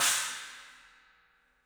CYMBALS; 3D Sound; 3D Drums

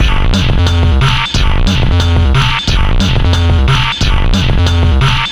square down 090bpm, downbeat dance grooves; Zero-G

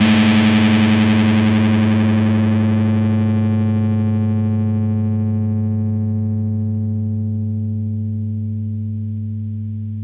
rez rhodes; PlatinumPhattCD2; E-MU Producer Series Vol. 8 – Platinum Phatt

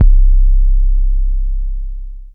DM BAS, Dance Mega Synth; Best Service

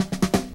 sdr, Hip Hop & RnB

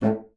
A2, bassoon; Xsample VOL-6; C BASSOON

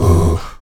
VOX AHOO, Afro Chants, Stacc Ah Oos

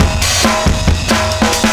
138 bpm, breaks; Zero-G Beneath the Planet of the Breaks

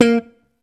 POP, Bass Electric

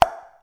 VOC DRUM, MOUTH PERC; Best Service Hyper Dance

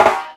SD, snares; EastWest; Dance Mega Hip Hop Electro

